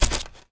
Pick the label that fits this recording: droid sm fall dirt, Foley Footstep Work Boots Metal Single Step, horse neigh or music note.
droid sm fall dirt